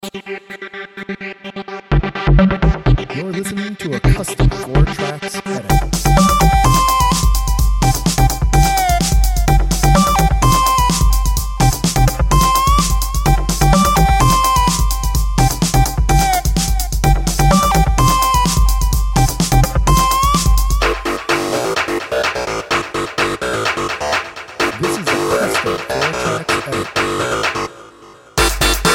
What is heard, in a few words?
moves like robot